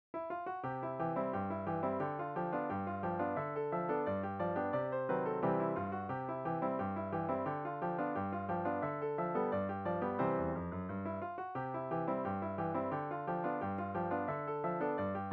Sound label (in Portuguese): (piano)